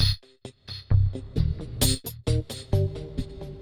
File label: Funky 125-132 Cm7